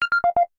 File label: name change; general messageboards